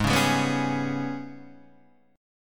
Ab Minor Major 7th Double Flat 5th, down, Guitar, DADGAD